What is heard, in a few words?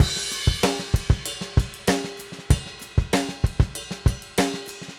Drums Crash, 70s Analog Funk